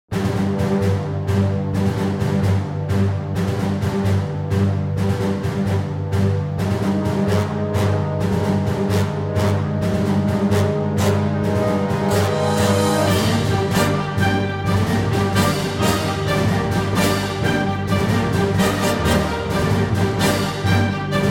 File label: Epicbattle; Hearts of Iron III